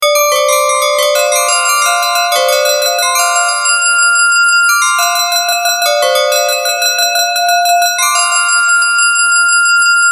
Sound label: Smooth; Realtones